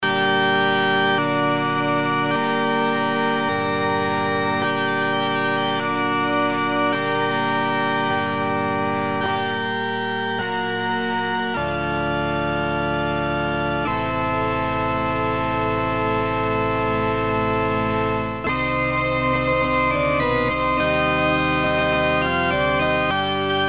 Organ Prelude

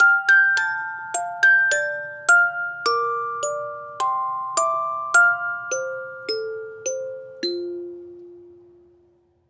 Music Box; Assassin's Creed Syndicate

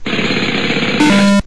SFX Worker Failure; life is a game android

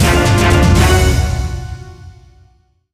Wave Start; Dillon's Dead-Heat Breakers; Nintendo 3DS

